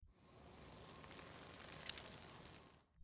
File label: tumbleweed rolling; weather and nature